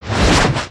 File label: Swim, foley